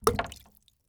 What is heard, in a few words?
Liquid Water Coin Dropping Into Tub Of Water